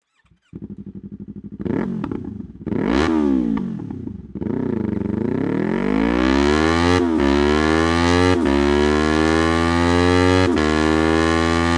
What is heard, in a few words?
akrapovic slip-on line titanium fuer yamaha r3 mt-03; ab modelljahr 2022